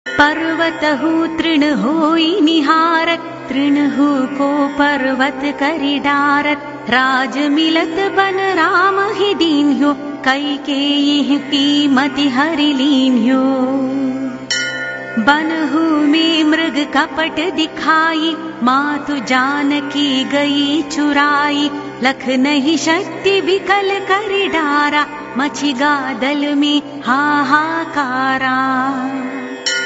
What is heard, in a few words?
shani chalisa; deities